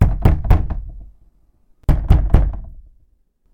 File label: knock on Fusuma; knocking